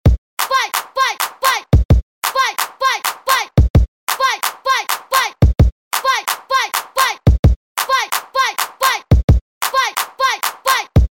beat baile de favela

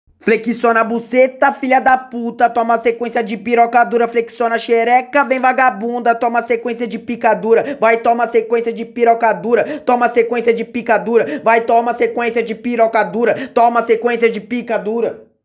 ACAPELLA

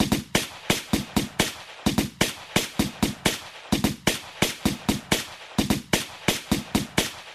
Ponto Tiro

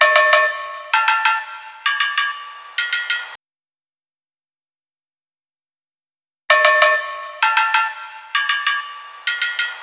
Caixa

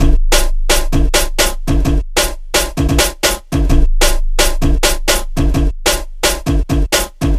BEAT

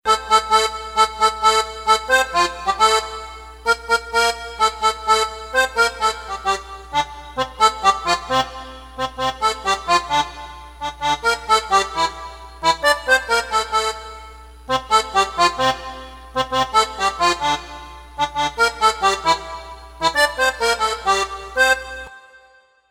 SANFONA DE NATAL

